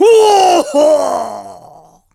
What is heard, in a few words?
Woohoh; Sir Combsly: "Woo-hoh!!" (heard when completing the Den of Trials)